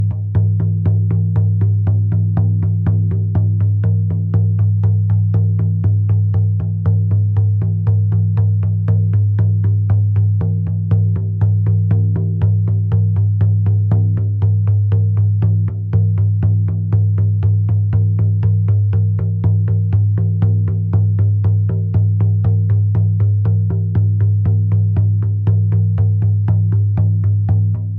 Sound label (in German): Hörprobe Trommel hell